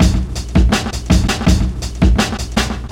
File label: Drum loops, Boombap